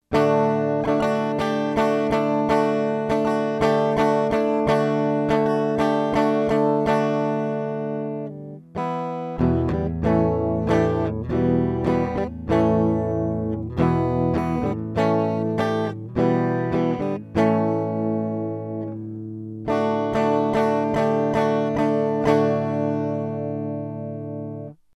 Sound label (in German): Gitarre; ESI Neva Duo